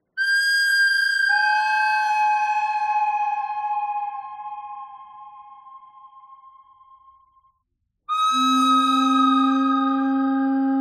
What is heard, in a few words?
bird thread pentatonic; noreverb